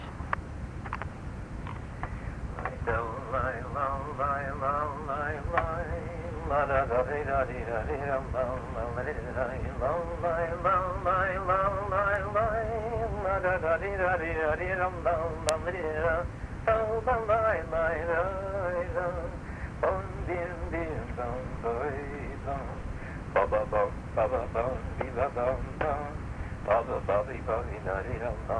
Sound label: hungarian chardash